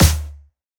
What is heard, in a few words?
drum hit finish